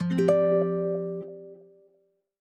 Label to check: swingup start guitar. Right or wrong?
Right